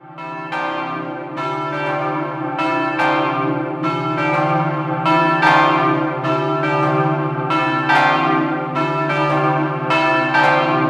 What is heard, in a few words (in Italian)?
Cavasso Nuovo, campane